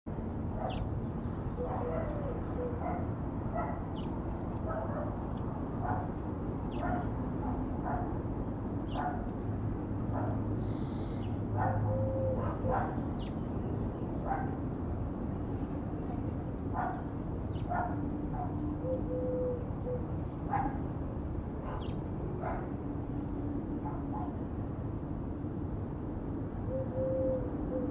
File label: birds and dogs